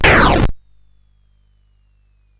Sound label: Frantic, CSG8580R5